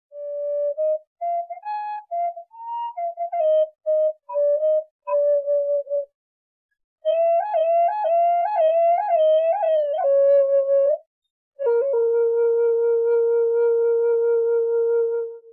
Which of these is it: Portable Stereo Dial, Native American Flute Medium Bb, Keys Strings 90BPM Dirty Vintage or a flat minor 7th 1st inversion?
Native American Flute Medium Bb